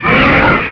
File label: jump; werewolf2